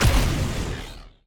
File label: shoot Navanax; Mindustry